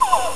battle; SpaceCamel

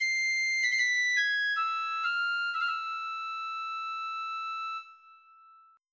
FLUTE

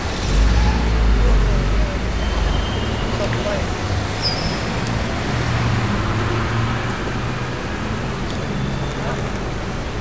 city

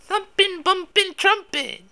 thumpin trumpin; Spades Deluxe

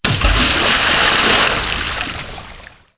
fall in water; HereticII

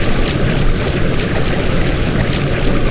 Water Internal; RallyXtreme; Surfaces

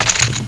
Chips on Felt; iPoker 2000

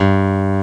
piano G1; ClockWerx

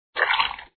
glue in

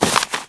item paper pu; Spearhead Demo; MacFormat 2003 June

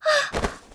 voice tackle; FantasyTennis

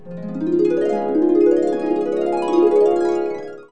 harp

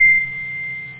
jahr bell high high; OctaMED SoundStudio V1; instruments

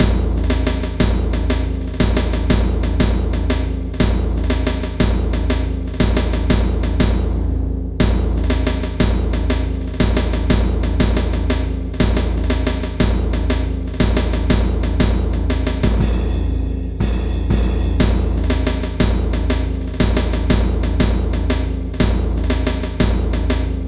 cut

